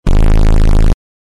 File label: Bass boost impact short; bass boosted